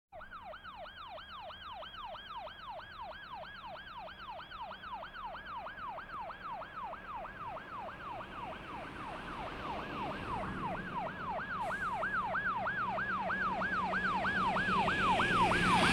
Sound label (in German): polizeiauto mit sirene kommt näher und hält; Großstadtgeraeusche